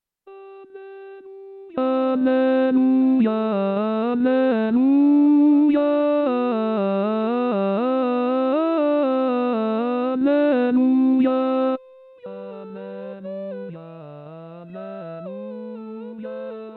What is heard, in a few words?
Inno Di Pasqua tenori; Bach